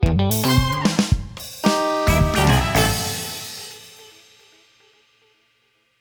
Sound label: Music Disco DJ Outro